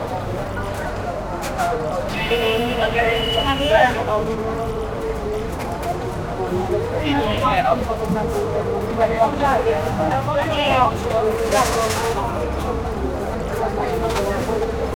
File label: generate an ambient sound, bustling